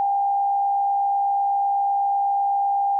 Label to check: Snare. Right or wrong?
Wrong